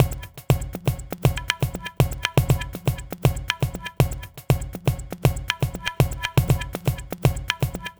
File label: Ethnotronic, Processed Percussion; Sonic Foundry (Sony Creative Software); Rhythmicronics